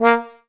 Trombone Stac A; Samulis; pitchglitch

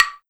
Perc Woodblock; Gimme A Hand V1

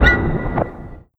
Scifi Drum Metal; Audiopapkin

